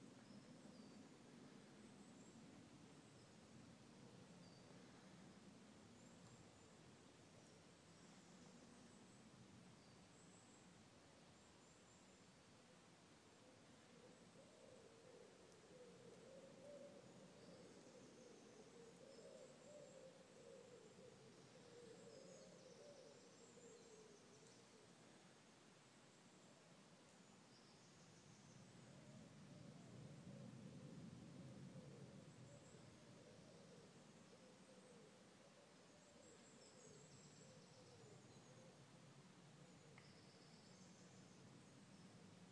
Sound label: peaceful woods